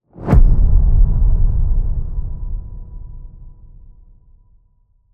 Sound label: Grand Hit B; cinematic grand hit